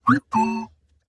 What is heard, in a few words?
beeps "Thank you"; 堡垒（守望先锋2）; 获得赏金